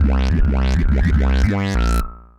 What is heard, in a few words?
Roland F Line, Roland S100